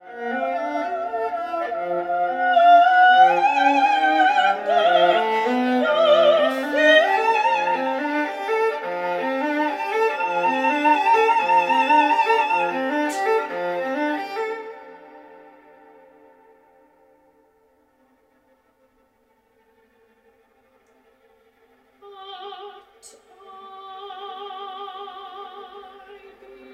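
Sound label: soprano, viola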